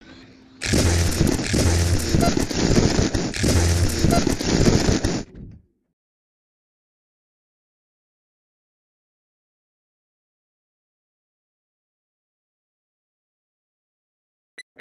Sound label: slap; splaats basics the factory chapter 2